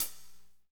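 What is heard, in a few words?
hi-hat, Pop Country, Dry; Northstar; Drumscapes Roland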